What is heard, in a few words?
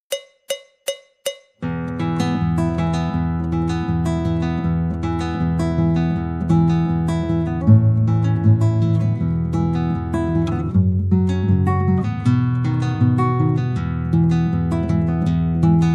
jingle bells